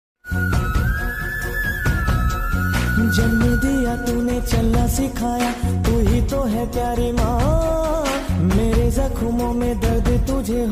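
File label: sad ringtone south india ringtone movie very sad; pogaru movie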